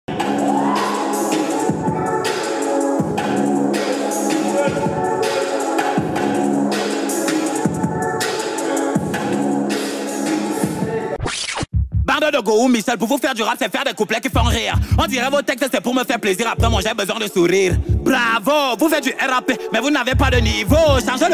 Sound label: CYPHER TENOR